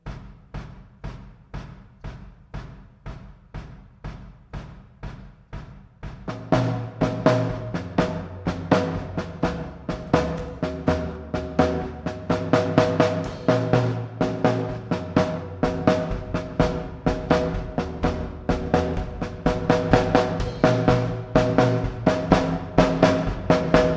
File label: Drum